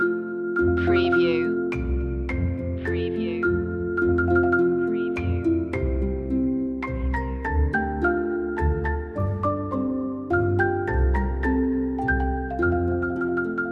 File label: orchestral loop; the holly and the ivy; media music now